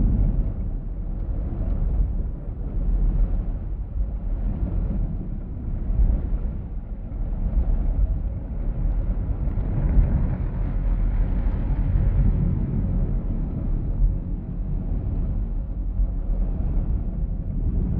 colossus idle; aquatics

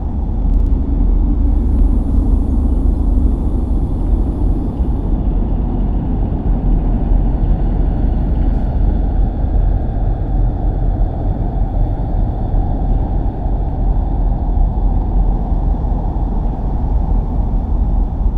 cruiser hum; nemesis; Stellaris

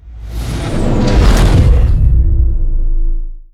assimilate fire layer; apocalypse

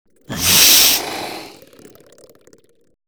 greetings reptilian special; Stellaris

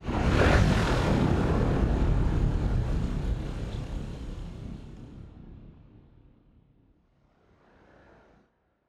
ship destroyer move; Stellaris; machineage

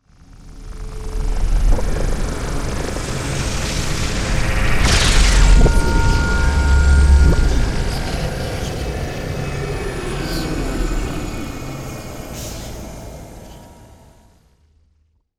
relic activation perforator; vultaum real

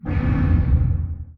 distance laser fire, weapons; Stellaris

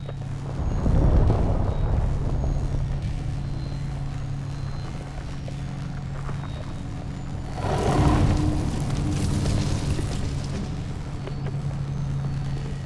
amb wormhole station idle; necroid; Stellaris; necroids

